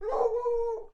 Beagle Bark Interior Hard Walls

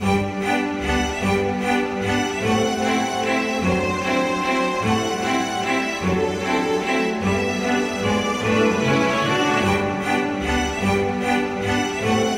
Classical Waltz Loop

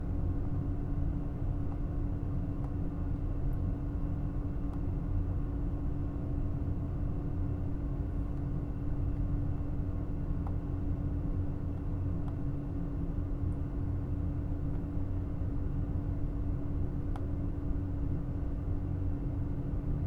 Air Duct

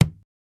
Thick Stamp no sub

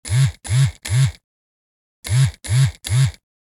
Mobile Phone Vibrating

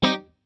C Minor Skank Low

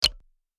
Button Click

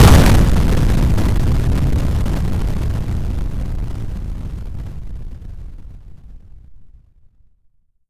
Expl

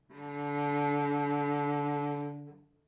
Violoncello D 4 Tenuto Vibrato

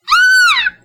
Excited Scream; Pinkie Pie

